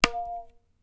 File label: tabla; Dirt-Samples